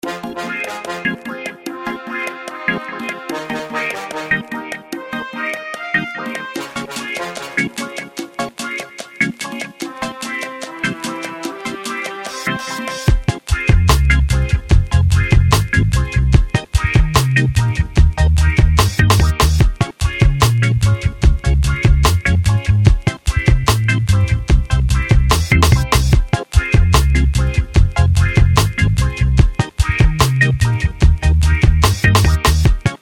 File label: Reggae